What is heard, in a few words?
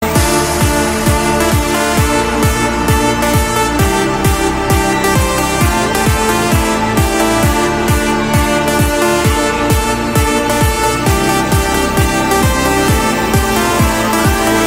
IPhone 8-8plus